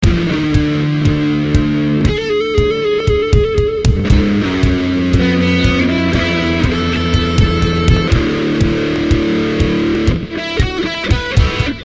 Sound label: bad guitar solo; Strong Bad's Cool Game for Attractive People